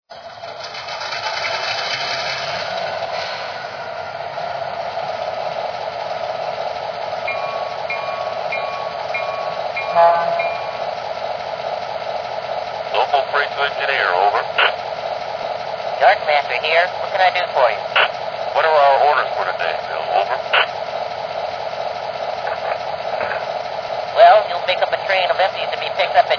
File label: SW1500, trains